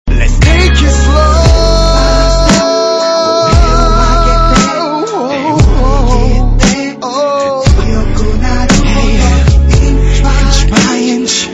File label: Rain Slowly